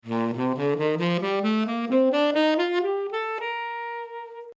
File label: Reed, saxophone